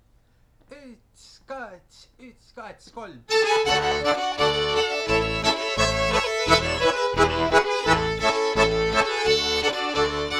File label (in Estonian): traktoristide laul